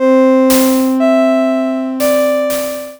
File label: music